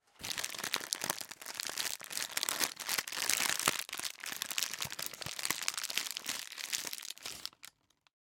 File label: Foley handling wrapping paper; refocus